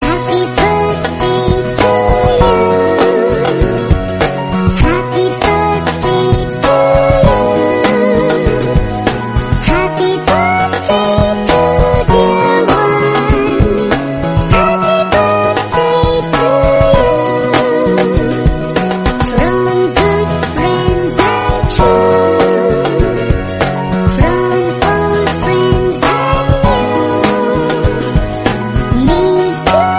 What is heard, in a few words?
baby voice happy birthday